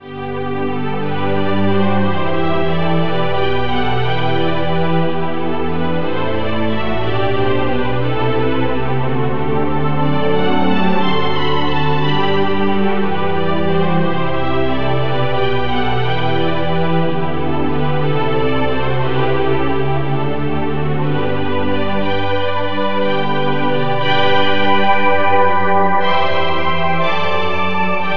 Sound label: sweep pad